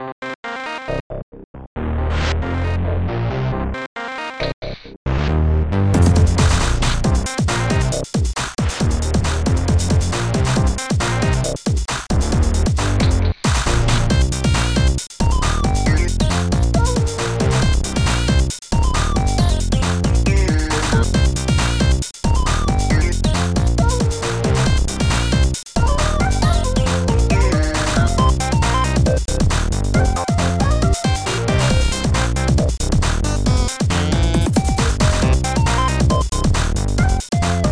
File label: industrial fudge; da capo vol1; frontier